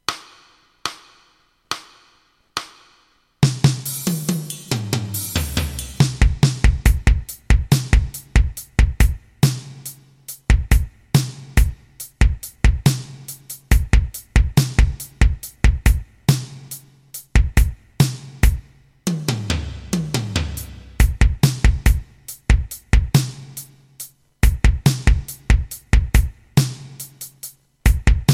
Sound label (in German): rock etuede, 70